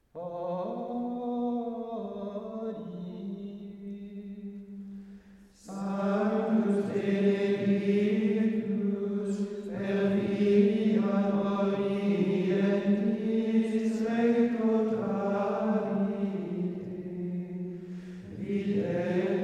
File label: Antiphon HODIE S. BENEDICTUS